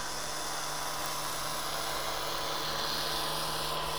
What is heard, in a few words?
High Speed Ferry; WWF